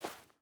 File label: footstep burlap snow walk; Rust